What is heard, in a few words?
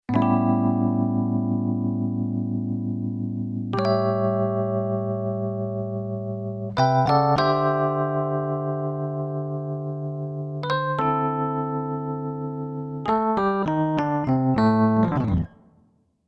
Keyboard Pf Blues Pf